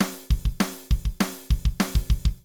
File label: conflict drums